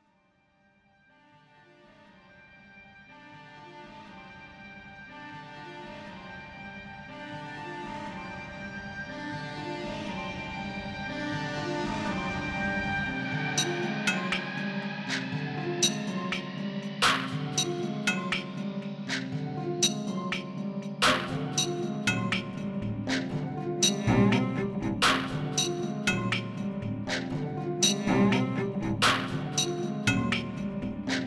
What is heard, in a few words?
cello